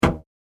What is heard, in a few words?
Big Elastic Band Ping Sound, twang; Twang Sound Effects